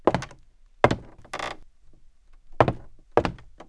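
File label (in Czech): kroky; Mafie 1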